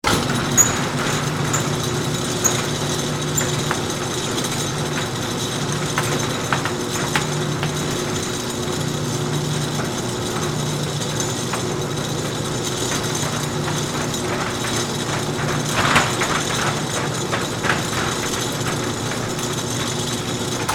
Cargo Bay Door Open, Industry, Cargo, Bay, Door, Open, Auto, Shop; Garage Doors; SoundEffects-BlastWave